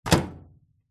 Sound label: household microwave door close